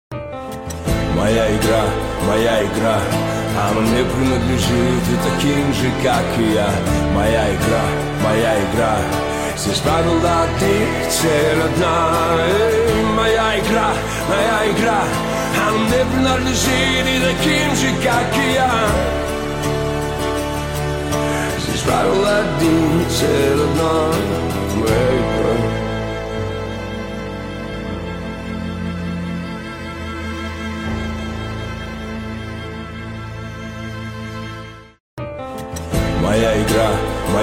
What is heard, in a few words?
acoustic version